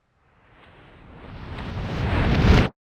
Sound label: Wind Blast Build Up